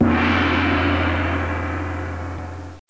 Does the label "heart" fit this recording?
No